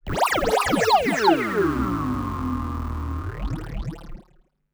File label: Virus LFO Sweep